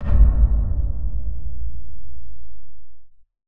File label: High Impact, med dark